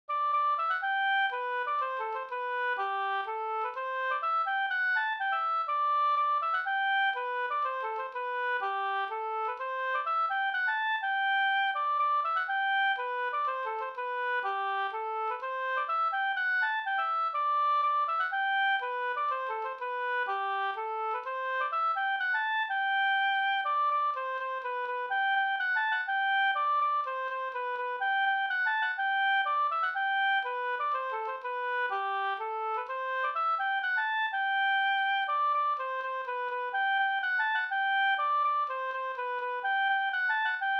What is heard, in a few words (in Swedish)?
slangpolska